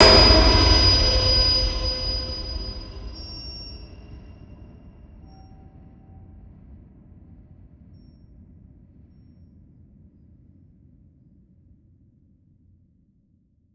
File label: Piercing; Cinematic Impacts; 99Sounds; Rumore Cinematic Impacts